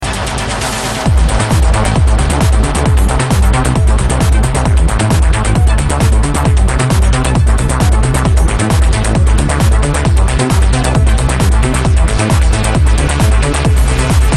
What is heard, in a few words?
Cool Party Beats